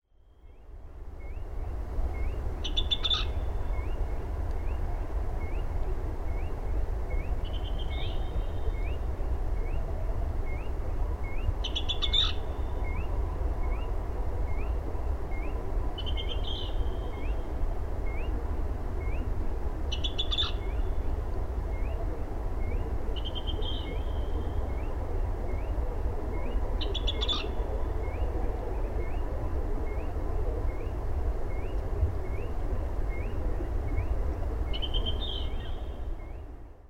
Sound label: yell